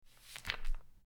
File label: Flipping pages